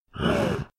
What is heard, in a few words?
Cultist Notice Noise; Devour